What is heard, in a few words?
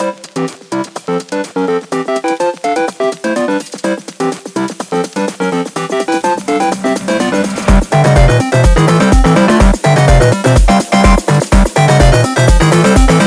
super mario phonk; club